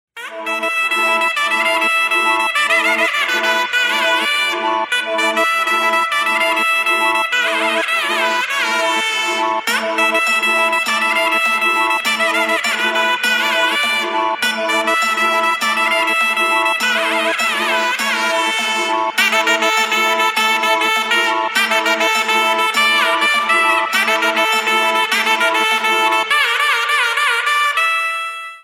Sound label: BGM Cornet Ringtone; Akhanda Thandavam